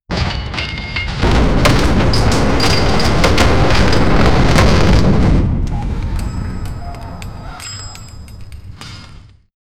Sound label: intense medieval fantasy, battle cries